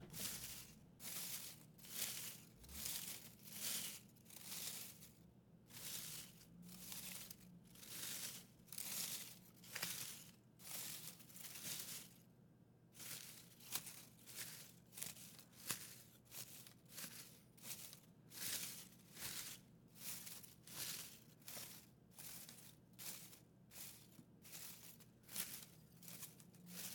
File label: Steps On Dry Grass